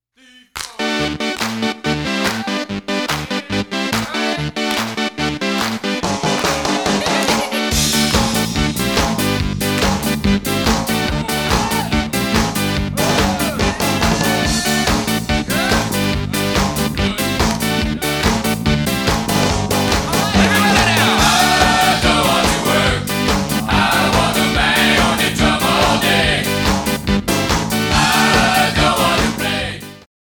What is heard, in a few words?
bang drum